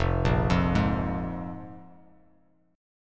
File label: F Minor Double Flat 5th, F, Bass Standard; Fmbb5 arpeggiated